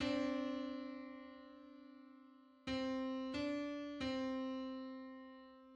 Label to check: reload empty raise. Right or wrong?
Wrong